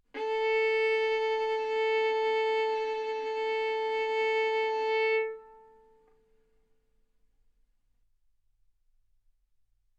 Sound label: Violin arco mf sul A A4 B4 stereo